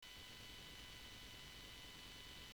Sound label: no notes, digital piano